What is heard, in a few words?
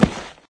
grass; Novetus